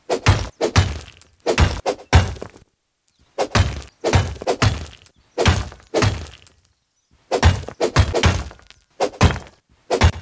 mining Sound; Soulforge Tower Defence

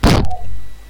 kaboom

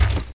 large Arrow Fired Sound; Soulforge Tower Defence

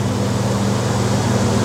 fan Sound; Soulforge Tower Defence

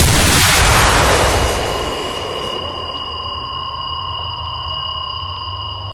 laser Beam Sound Effect; Soulforge Tower Defence

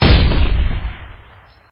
anti Air Gun Sound; Soulforge Tower Defence

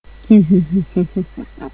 mouahahahaha

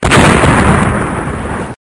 explosion Sound; Soulforge Tower Defence

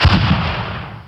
rocket Launcher Sound Effect; Soulforge Tower Defence

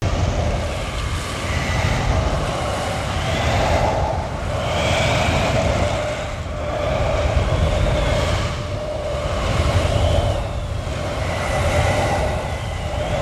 tornado Sound Effect; Soulforge Tower Defence

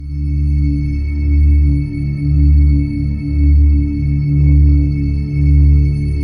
altar Sound; Soulforge Tower Defence

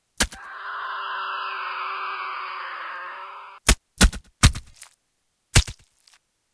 soul Factory Sound; Soulforge Tower Defence

